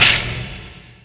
static storm end; weapons; dota; disruptor

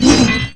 preattack; spirit breaker

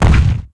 walk, footsteps; earthshaker

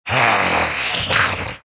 snarl; Undying

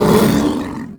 death dragonspawn sorcerer, creep deaths; dota

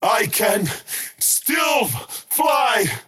skywrath crown move pain; skywrath mage

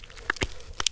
foley, footsteps; physics; undying

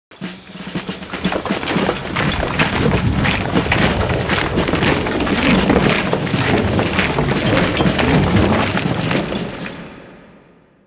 march of machines; tinker

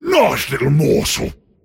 last hit pain; pudge

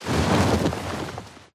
wings; gore magala; mh event

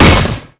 attack; sven

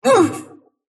sylph pain; dota; dark willow

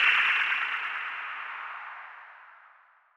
raptor target; weapons; dota; kez

